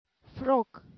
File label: frog